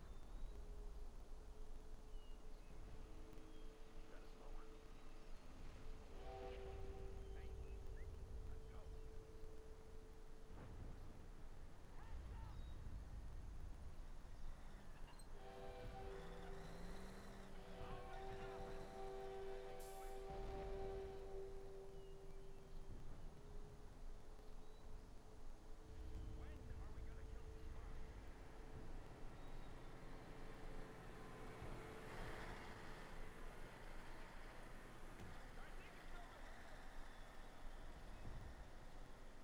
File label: allied camp; WAR